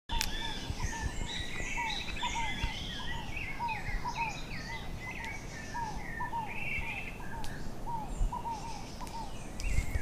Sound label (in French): Chant oiseaux matin